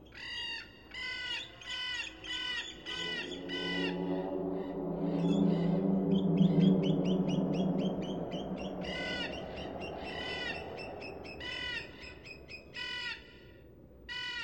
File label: Crow Echo; ambience